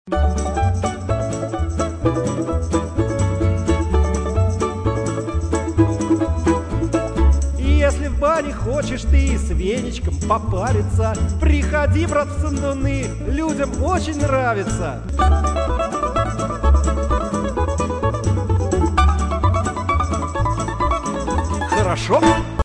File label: Russian Jingle